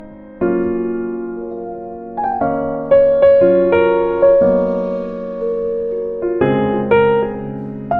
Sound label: music box creepy; Horror